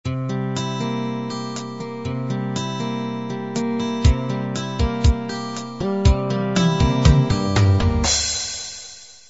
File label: pop beat intro